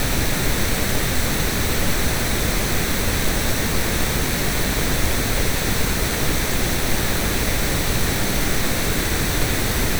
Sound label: pink noise spatial stereo